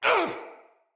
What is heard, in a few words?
Pain, Soldier Male; Battle; Barks; Dialog; Dendrit